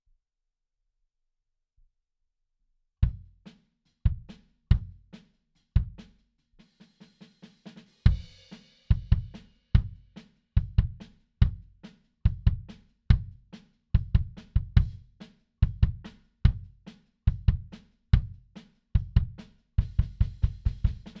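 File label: Bass Drum In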